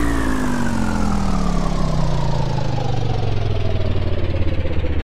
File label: Bike Slow; Teen Titans go! H.I.V.E. 5 Robin Vs See-More